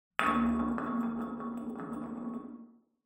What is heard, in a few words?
glass settle; ambient; amb oneshot